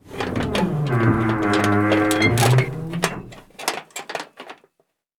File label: creak metal; dod